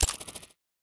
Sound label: ui locate